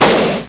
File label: damage